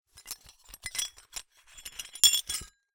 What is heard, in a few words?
Hole Saw Rattle, Hole Saw Bits